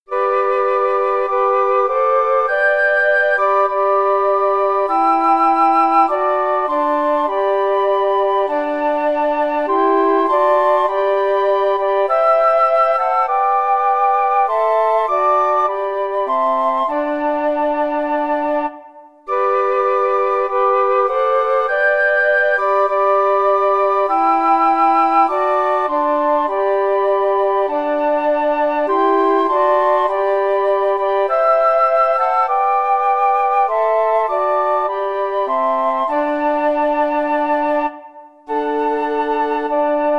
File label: lord of hosts, flute quartet